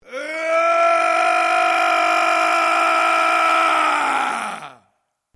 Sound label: MALE ROAR AGGRESSIVE LONG; male vocalisations; Yelling Sound Effects; Yelling (Sound Effects:Voice)